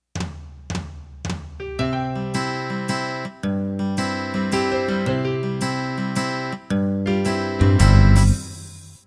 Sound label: Country 3-4 Intro